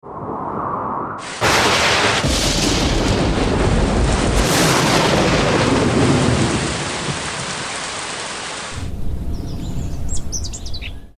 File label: weather sounds